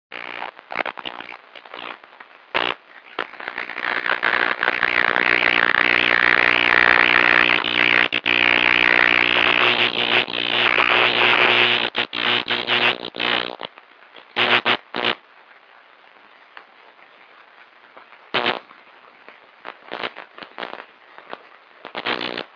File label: powerline 2m